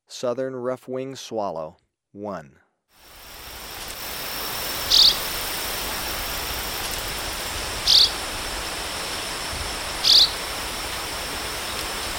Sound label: Southern Rough-winged Swallow, Birds of Ecuador; S. America